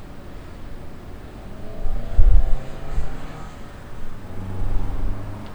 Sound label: car